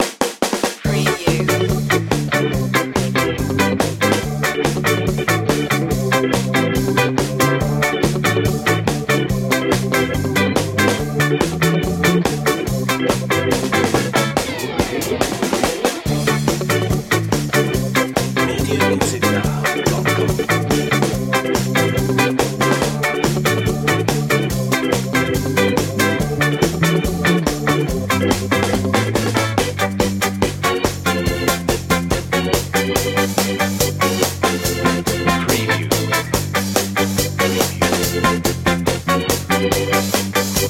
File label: stomp underscore